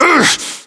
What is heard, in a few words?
pain, voiceovers; english